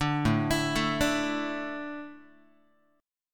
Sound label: A Suspended 4th, Guitar; Asus4 arpeggiated; JGuitar